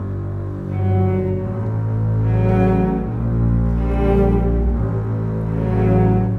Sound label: Cinematic Strings, Orchestral